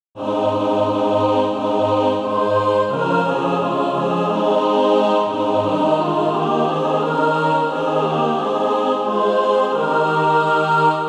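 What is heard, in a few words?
mixed, Practise Music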